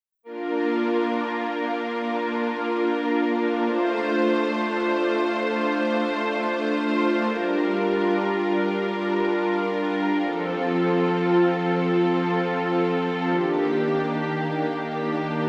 PX S5000 strings

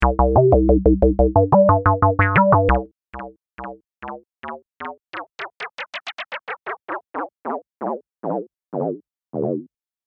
dub delay automation; psp echo

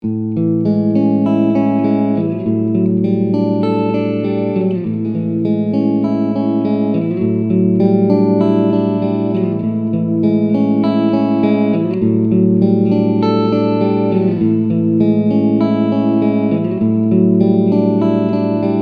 yamaha pacifica 112v clean neck

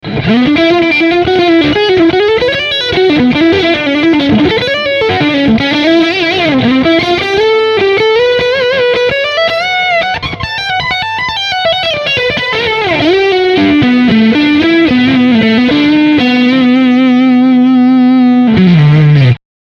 marshall plexi drive valve; uad; quacki drive; valve mikro

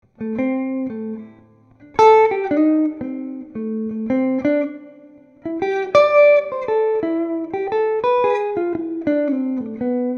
lick neck clean coil-split, epiphone sg g-400 pro

